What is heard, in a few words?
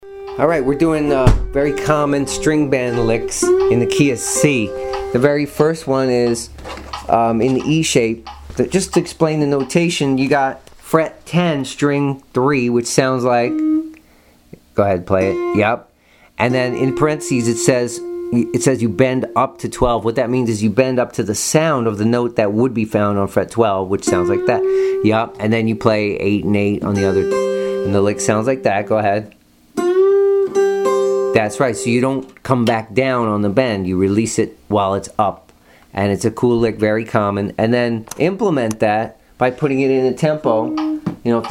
string bend lick